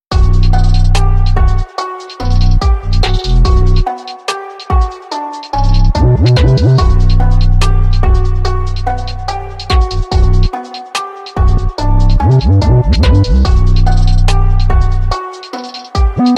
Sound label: Instrumental Rap Hip Hop Beats Japanese Trap Drill